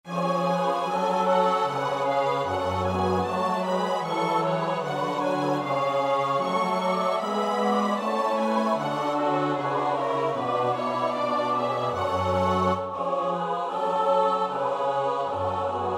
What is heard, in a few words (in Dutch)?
S-A-T-B, koorpartij; Bach (Johann Sebastiaan); BWV 185; Ich ruf zu dir, Herr Jesu Christ